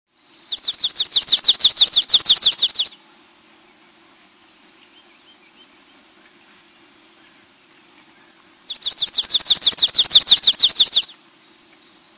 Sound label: palm warbler